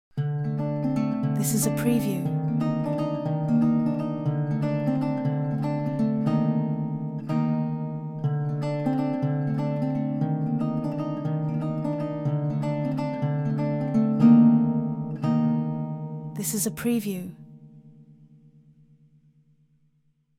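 loop D phrygian 120 bpm, Ancient Lyra Sample Loops; ancient greece; Lyra of Apollo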